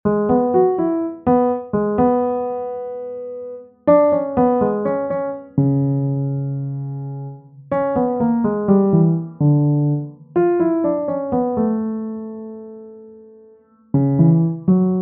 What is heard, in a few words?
bossa nova